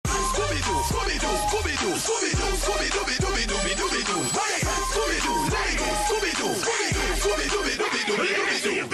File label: scooby doo creepy run